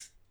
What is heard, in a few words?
walk